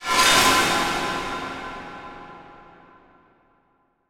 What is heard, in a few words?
stab shocking scary